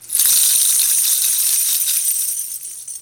belt, Belly Dance Belt with Stones, Mirrors & Coins